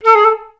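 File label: flutes A3; Best Service; Peter Siedlaczek's Advanced Orchestra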